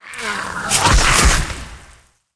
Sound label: vine attack, monster